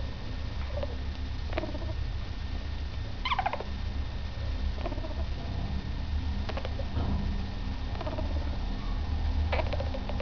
drohendes gurren

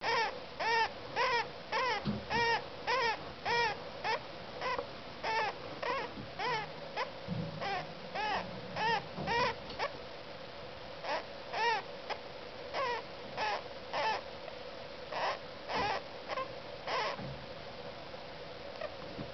quietschende atmung

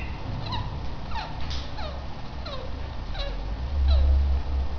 quietschende atmung

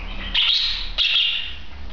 einzelruf wellensittich

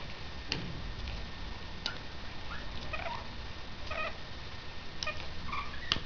aechzen wellensittich

